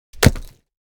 Hard soil rock pieces thrown on rock bricks

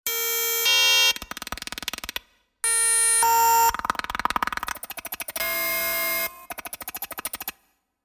Futuristic data calculations medium

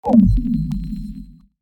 Casual mobile game menu ui clear

